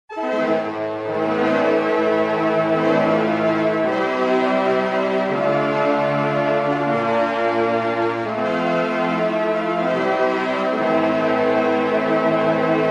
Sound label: muted brass